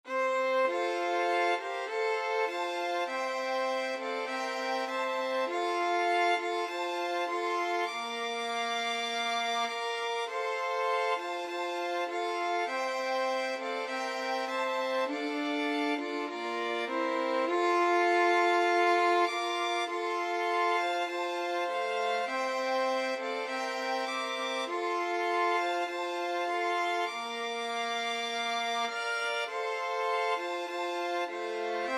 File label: violin quartet